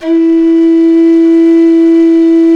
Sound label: SULING E1, Exotic Winds